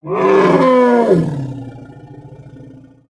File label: Pets Elephant Beg; creatures; Lego